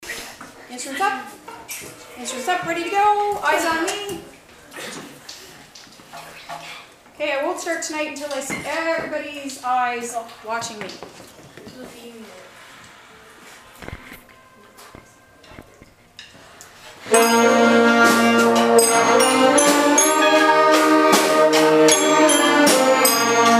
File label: Strings